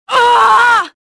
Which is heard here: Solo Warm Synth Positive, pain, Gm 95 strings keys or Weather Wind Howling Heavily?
pain